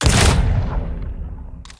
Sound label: atk bound